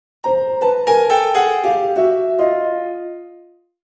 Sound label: Ultimatum Fail